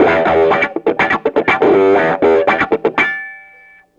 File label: Distorted Guitar